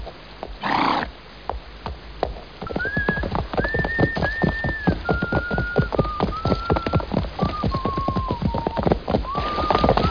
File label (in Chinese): 一个吹着口哨的人骑着马走到了河边